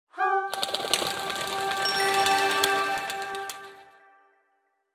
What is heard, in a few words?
Bgm Release; Hokaku